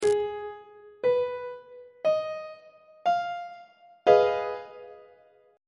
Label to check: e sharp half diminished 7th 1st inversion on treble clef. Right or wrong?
Right